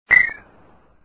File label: ping